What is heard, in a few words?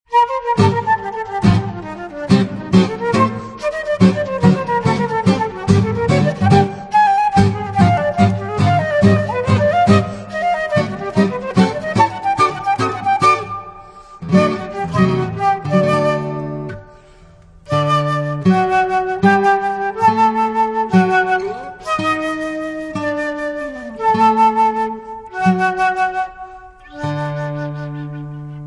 Flute Guitar